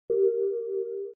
Close, Event sounds; LG KF900 Prada II

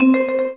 Key Tone

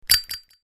open; Huawei C5800; flip

